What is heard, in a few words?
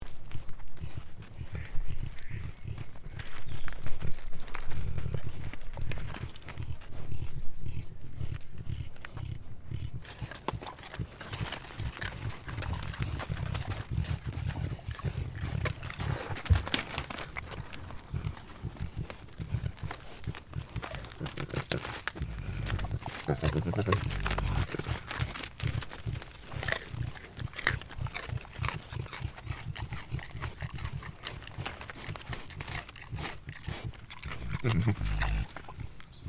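javelina foraging; javlina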